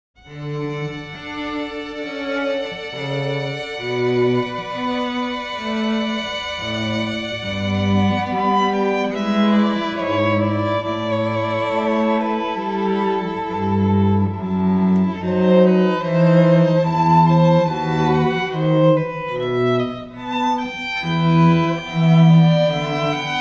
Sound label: Air on a G string